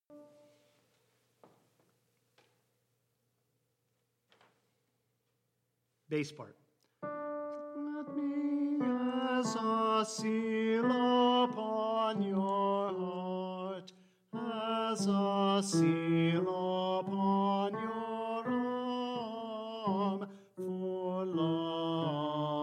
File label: Bass